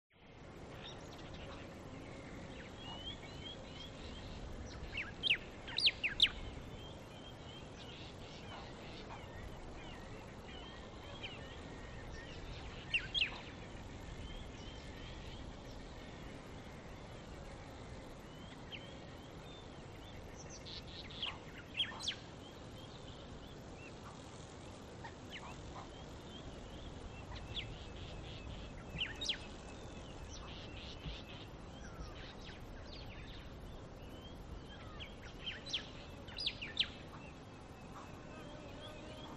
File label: BUSH Water Hole Dusk Birds Insects; ATMO; smartsound